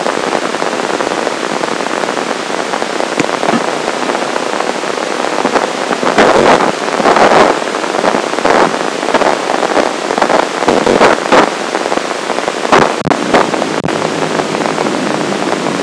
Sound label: Cockroach, neurophysiological signals; Neurobiophysics; The Auditory System